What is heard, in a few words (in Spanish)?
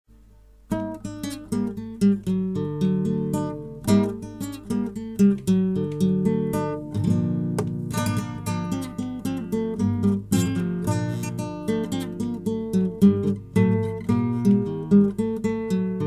sonido guitarra